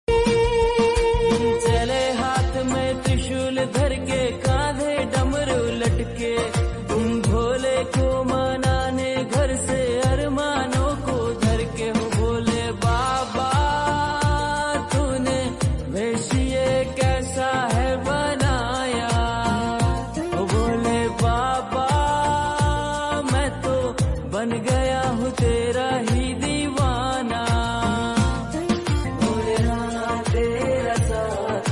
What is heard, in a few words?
Bholenath Mahadev Song Ringtone